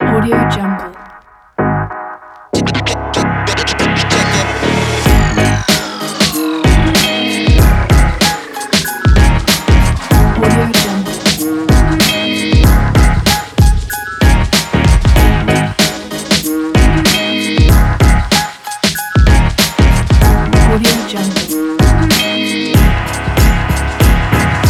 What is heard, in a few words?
hip hop positive